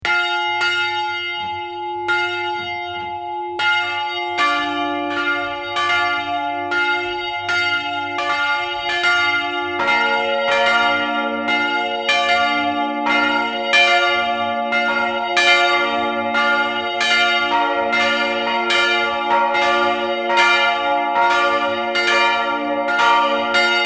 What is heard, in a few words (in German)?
glocken lauenstein; glocken tv